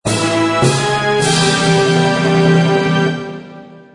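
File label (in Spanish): separador musical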